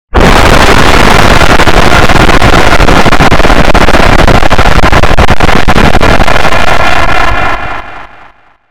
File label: jumpscare; garnold sprunki